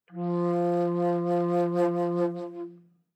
Bass Flute ff F3; Woodwinds

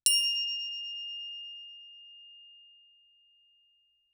Crotale ff F7 stereo; Percussion; Crotales

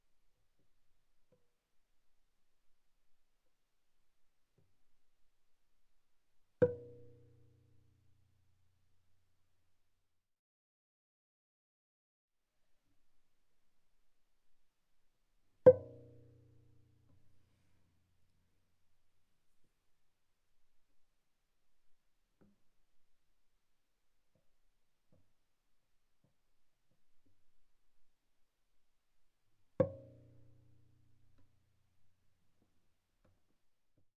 Viola pizz sul D mf C6 D6 mono

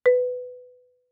Marimba cord ff B4; Percussion

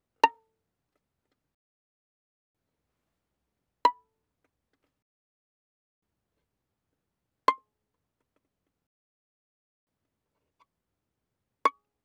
Violin pizz ff sul D Bb5 Db6

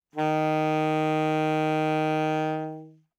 Alto Sax No Vib ff Eb3, Eb Alto Saxophone; Woodwinds; MIS Pitches - 2014

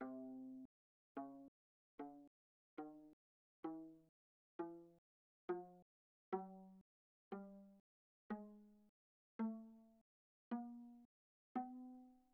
Viola pizz sul C mf C3 B3

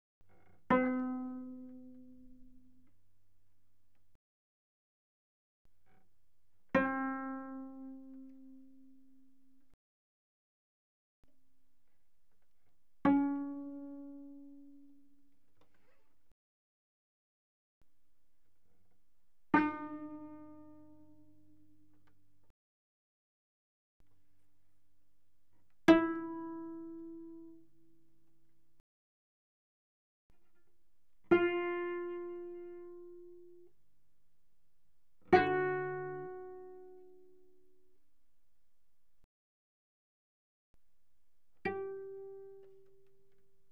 Cello pizz mf sul A B3 Bb4; Strings

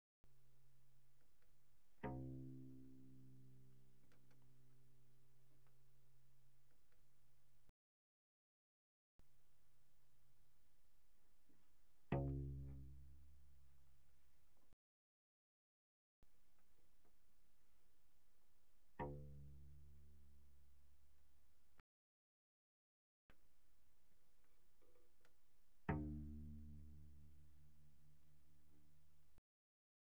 Cello pizz pp sul C C2 Bb2; Strings

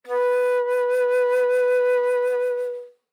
Alto Flute vib ff B4 stereo; Woodwinds

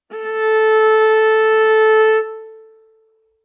Viola arco ff sul G A4 stereo; MIS Pitches - 2014; Strings

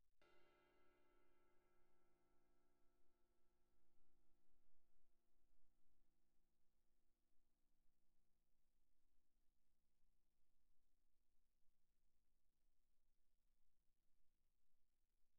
crash stick shoulder pp, suspended cymbals; Percussion

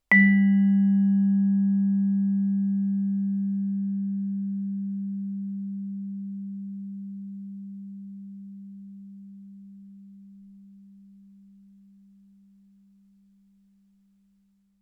Vibraphone sustain ff G3 stereo; MIS Pitches - 2014; Percussion

